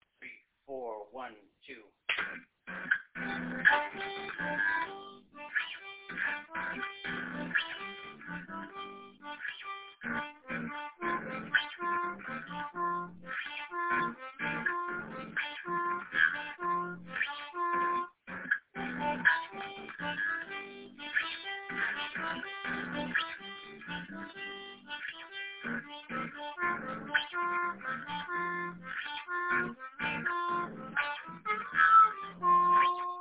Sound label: G to F to G to F over and over